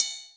ride, Millenium MPS-400

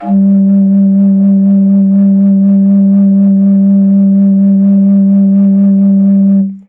g2, MOCENO BASSFLUTE; PS-52 AIFF WORLD INSTR 2; WOODWIND AND BRASS

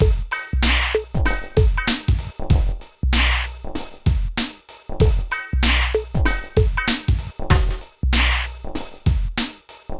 PHATT BEA, Hip Hop

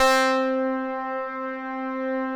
MW PULS

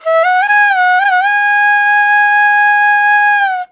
VENU, India Winds; World Combos; E-MU Producer Series Vol. 7 – Old World Instruments

